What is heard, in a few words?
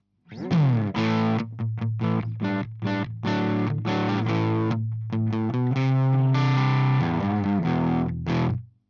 crunch Position 3 SM57